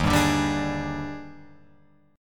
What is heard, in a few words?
Eb Major 11th, Guitar, Open F; EbM11; strummed; JGuitar